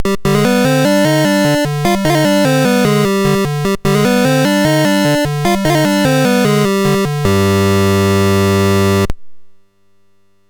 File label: Dragonworld sid MOS6581R4